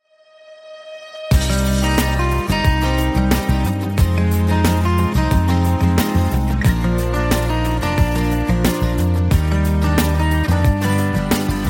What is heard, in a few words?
instrumental